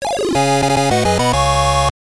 Start BGM; Star Pulse; x68000

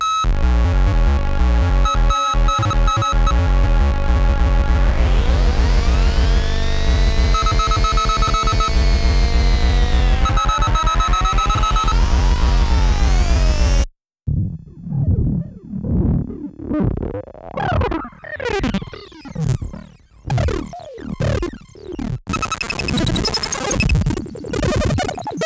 Analogue Solutions Vostok Matrix Synth

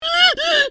Pigfall; bad piggies; android; mobile; windows

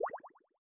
Teleportal Disc; Boomerang Fu

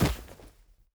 Land Feet; Afterbern Democralypse; Windows

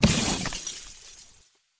Crash; Learn to Fly 2; Intro Crash Stuff

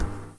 walk barefoot

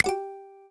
Doink

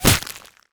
bullet impact ice

